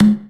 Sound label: Dirty Dutch Percussive; Mutekki Media; Vengeance Electroshock Vol.2; Dirty Dutch Special; Dirty Dutch Percussion